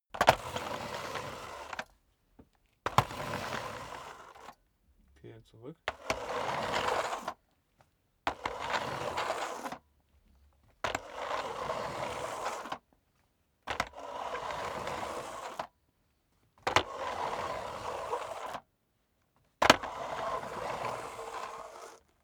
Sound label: vacuum sweep forward long sequence